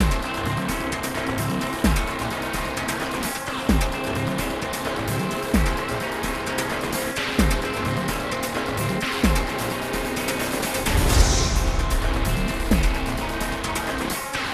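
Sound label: assault loop; transformers war for cybertron gamerip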